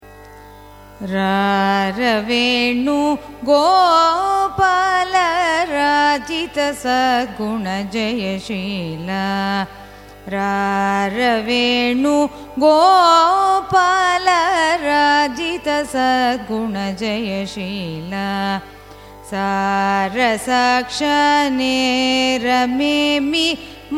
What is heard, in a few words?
raga bilahari carnatic; raravenu